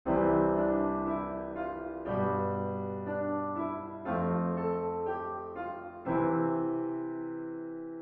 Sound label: passing tones